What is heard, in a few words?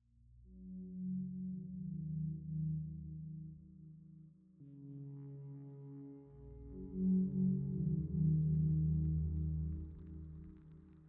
Atmospheric Soundscapes